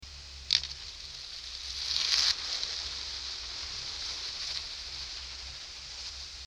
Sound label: Typical Boat at Idle